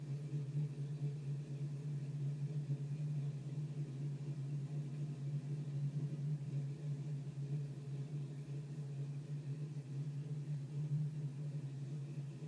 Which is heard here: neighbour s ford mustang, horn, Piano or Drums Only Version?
neighbour s ford mustang